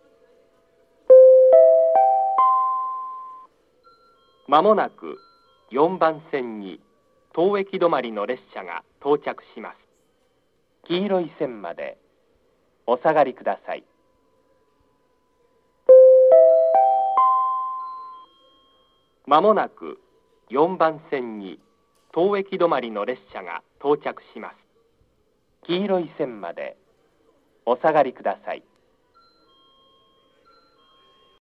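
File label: Toyohashi approach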